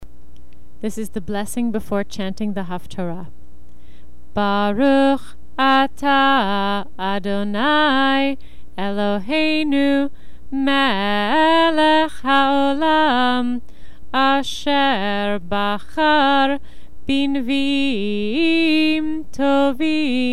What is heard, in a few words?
Blessing before Haftarah